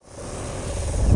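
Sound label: driller drill drilling kit tool; sound galaxy vr universal fx; nova sound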